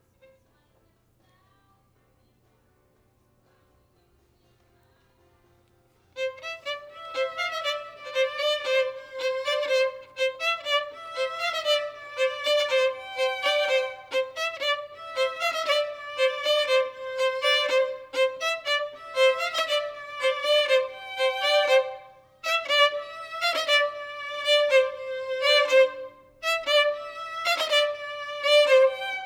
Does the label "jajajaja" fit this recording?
No